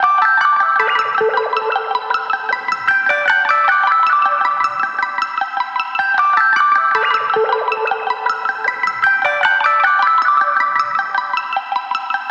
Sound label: 156 melodic stack Emin